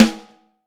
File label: HHT, Vintage Snare; Vintage Snare Drum